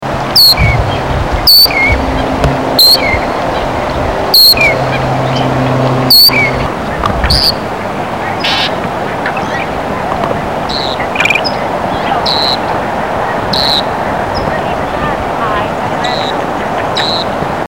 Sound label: Loggerhead Shrike